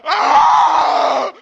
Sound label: death; cof